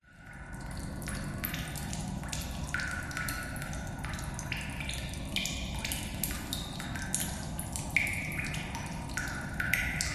nature cave ambience water drips reverb strong gusty howling wind outside